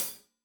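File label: hat closed, hi-hat cymbals stereo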